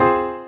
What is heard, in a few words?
Synth; osu